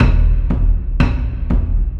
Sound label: level, scavenge, music; Left 4 Dead 2